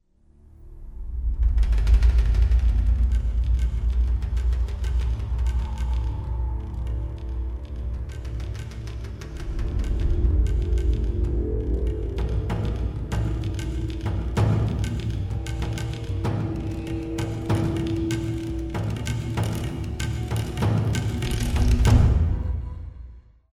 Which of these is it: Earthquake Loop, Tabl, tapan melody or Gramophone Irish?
Tabl